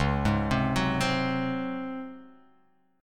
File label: Db Major 7th Suspended 4th, Guitar Open F; arpeggiated